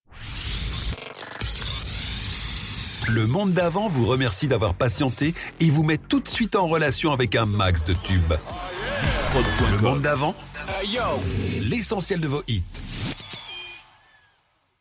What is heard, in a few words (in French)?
Jingle; Le monde davant; Raccrochage